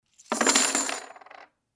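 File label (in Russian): several large coins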